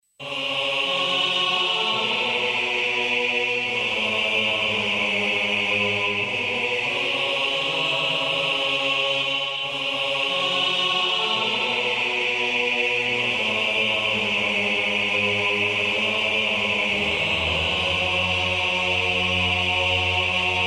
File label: Choir Aah